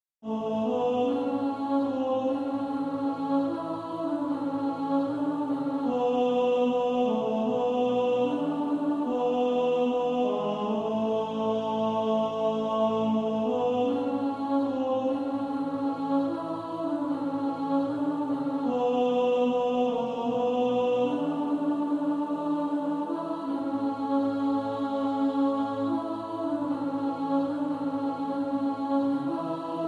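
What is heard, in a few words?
Will There Be Any Stars alto, choir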